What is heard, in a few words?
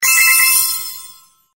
bubble clear alphabet mission